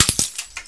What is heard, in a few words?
egg break, dino; My Animated Word Book